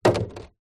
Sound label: plastic milk bottle empty drop concrete; foley